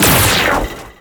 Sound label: Metronome; Amalgam Moa; Abilities